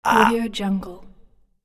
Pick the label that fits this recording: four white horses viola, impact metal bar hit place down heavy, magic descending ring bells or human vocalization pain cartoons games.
human vocalization pain cartoons games